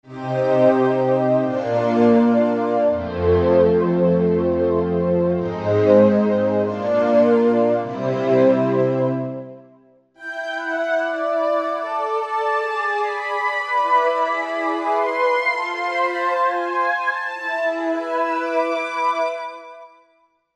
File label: Tunnel Pad, Korg MSC-12S Synth; Synth 3